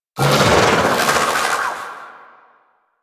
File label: Vocal Attack; Warframe; Sentients; Conculyst